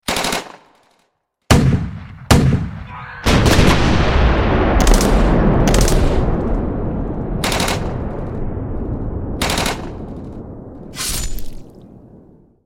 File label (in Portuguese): efeitos de guerra